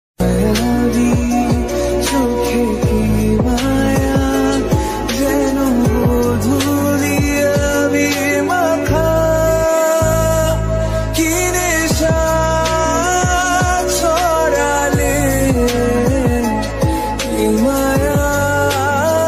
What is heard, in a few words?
bangla love ringtone